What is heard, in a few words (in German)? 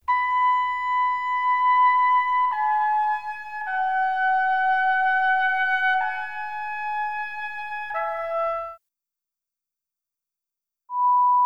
Klang Sinuston Rauschen Geraeusch; vermittlung neue musik; Hoerbeispiele